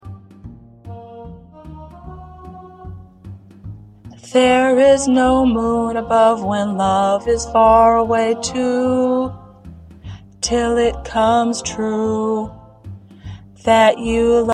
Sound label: Tenor; jazz tunes